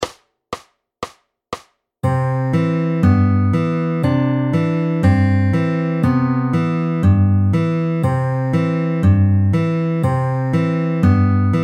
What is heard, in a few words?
FAST, fingerpicking